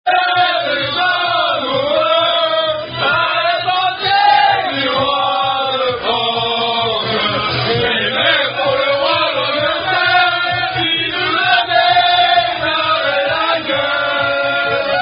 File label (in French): Chant Rugby, Unknown French Rugby Song